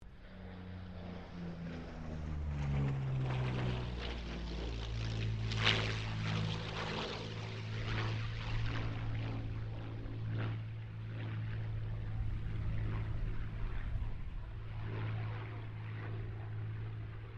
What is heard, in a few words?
AIRCRAFT P-40 KITTYHAWK PASS DISTANT; P-40 Kittyhawk / Warhawk / Tomahawk; Airplanes; Vehicles